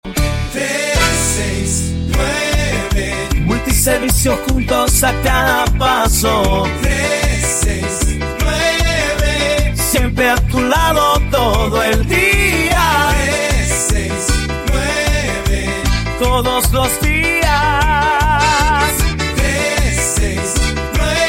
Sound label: JINGLE